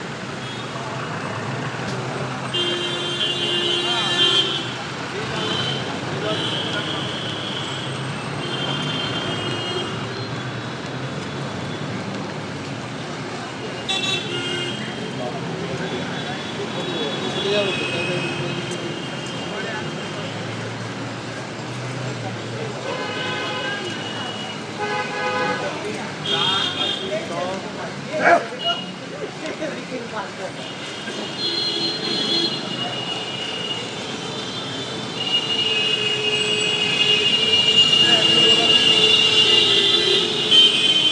bruits de boulevard kolkata

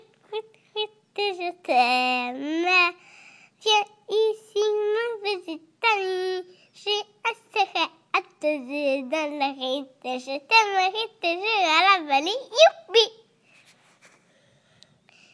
chante une chanson

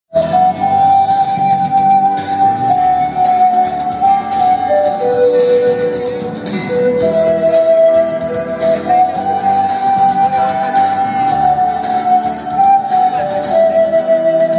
la flute indienne

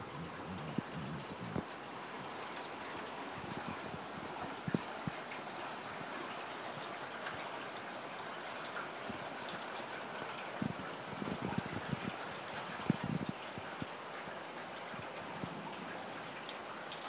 pluie de nuit sur le tour

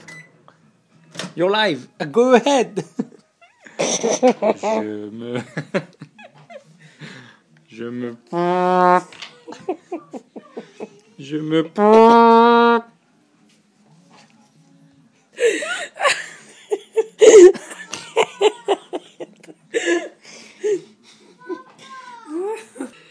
rire

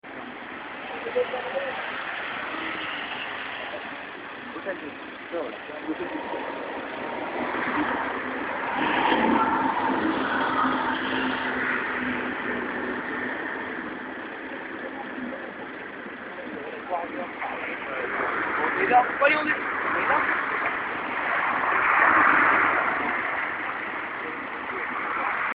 ambiance limoges rue des petites maisons

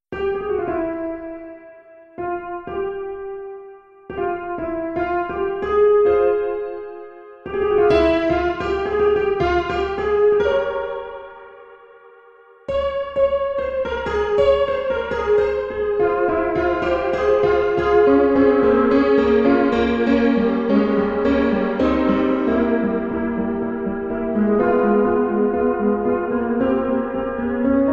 tango sur le piano

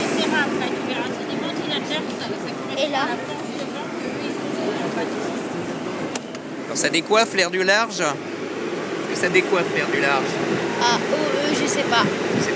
dans la soufflerie du large

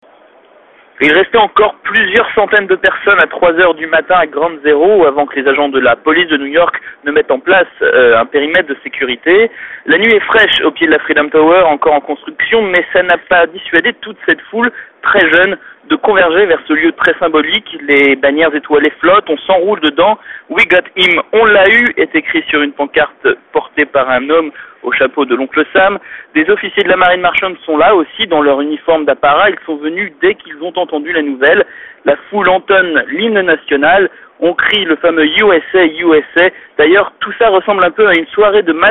une ambiance de match de foot; ground zero

